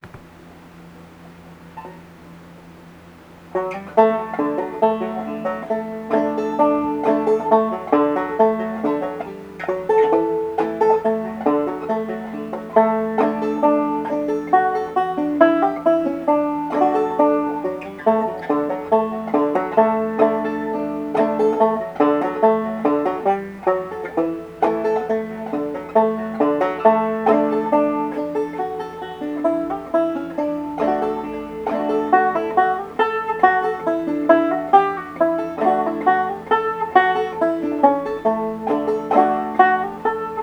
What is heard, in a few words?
soldiers joy, banjo